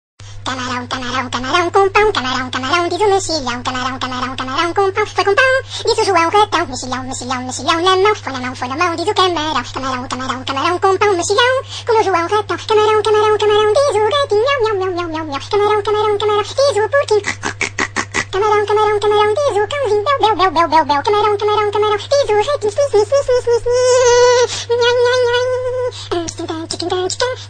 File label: yum yum yum funny ringtone